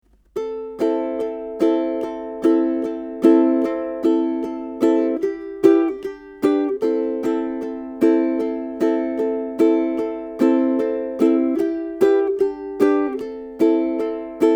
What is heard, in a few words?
Sera Backing, ukulele